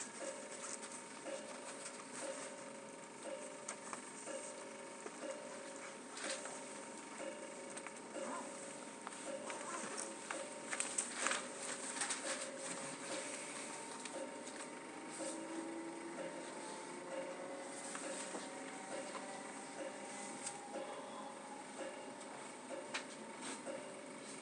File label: giant cowbell